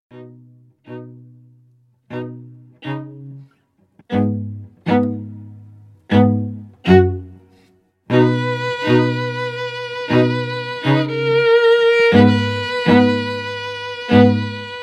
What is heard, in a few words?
Medium Uplifting Hopeful